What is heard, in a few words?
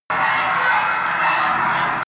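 screech scrape reverb, Science Fiction; sciene-fiction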